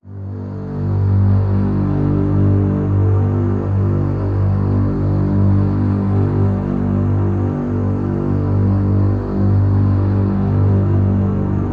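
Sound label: drone; Rosin G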